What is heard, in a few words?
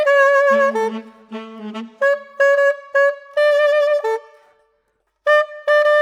Sax